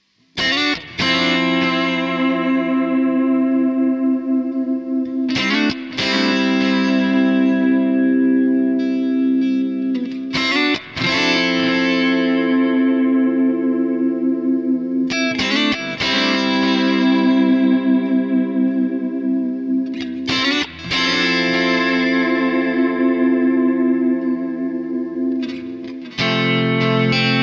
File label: EQD Silos Delay Analog Kontext Compressor MicroPitch Spring Reverb